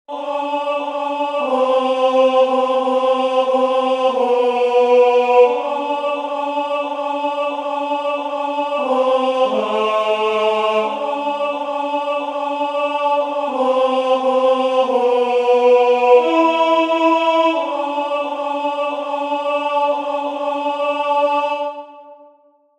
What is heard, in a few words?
Alto